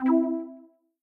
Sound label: Quick Stop Click Snd; fruitshopmegaways